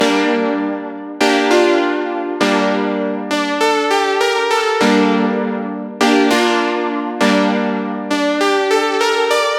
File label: 100 G Synth Old School Stabs; Zero-G; Future Wave